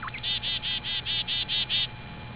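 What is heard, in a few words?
chickadee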